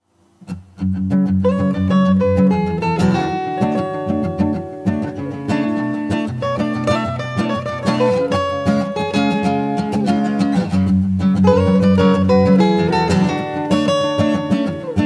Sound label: Acoustic